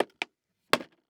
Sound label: Emergency First Aid Kit Plastic Unlocking And Opening; emergency effects; AdobeCC